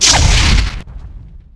Lightning; Mulcarn; Sid Meier's Civilization 4 Complete; Beyond the Sword; FfH Age of Ice; units

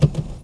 gear up; AssaultCube; Alien Stars; American Muscle Series

